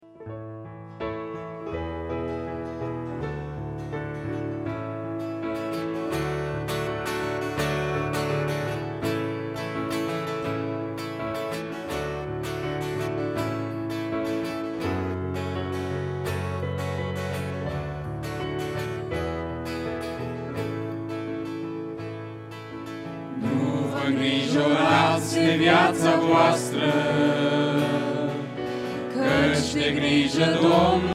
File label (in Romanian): Cantare in comun Tineret